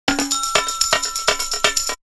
Percussion; Coldcut's Kleptomania VOL1

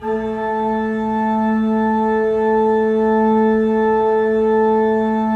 3D Pipe Mix Celeste, 3D Organs

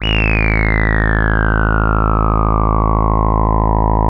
TAURUS C3, synthesizer; Hollywood Edge - Giorgio Moroder Rare Synthesizer Collection

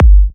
G, kicks; markus hakala kicks

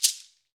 Ahfuche; Club-50 - Foundations Roland; Ahfuches

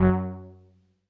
505, String Machines

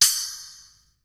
SPLASH, percussion reverb; MARKYDRUMS

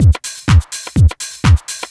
loop 125bpm, House Drum Loops

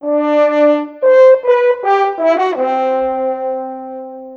Horns, Orchestral, Rock Pop; Sonic Foundry (Sony Creative Software); Orchestral Series VOL-4; Rock and Pop; Rock-Pop 23

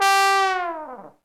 TROM LNG FALL

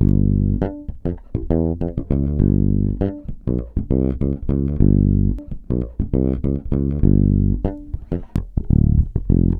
SHINN 100 G, Bass Grooves; USB Soundscan vol.30; AKAI

